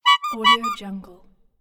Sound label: bulb horn honk; soundjay